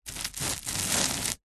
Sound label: container tub plastic heavy short slide gritty concrete